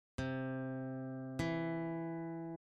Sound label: interval major third, guitar